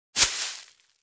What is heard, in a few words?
Foliage Impact Soft; hits & impacts